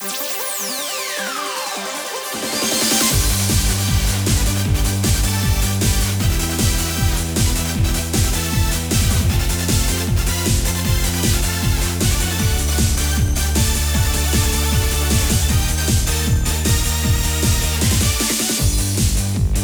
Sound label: karaoke bgm